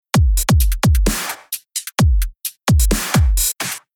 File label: Rhythm DubStep